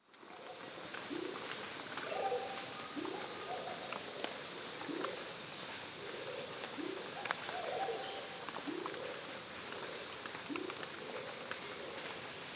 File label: Bolivian amazon after heavy rain frogs birds and dripping foliage; wilderness songs